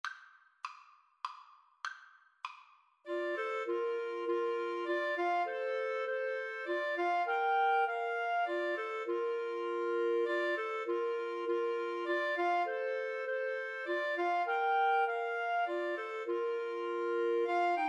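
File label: come thou fount 3 clarinet karaoke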